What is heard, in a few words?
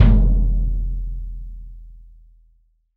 GONG BASS